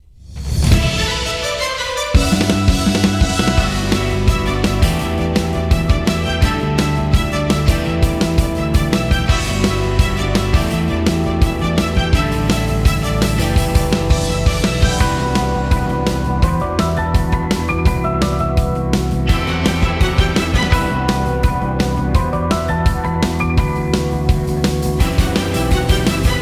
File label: Victory Music, Opening cue